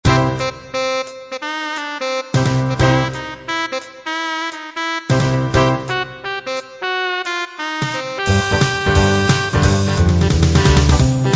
Retro Rock

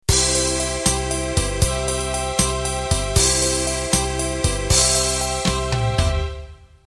12/8 Pop Intro

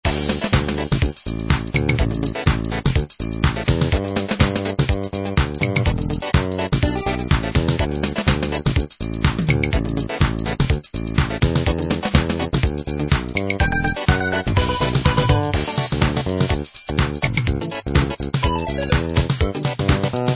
house

